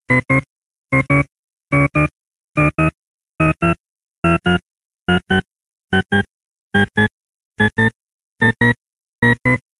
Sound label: Amazing Audio illusion